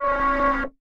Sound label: prox, ships computer